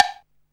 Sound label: Block, Alesis Hr16, Drums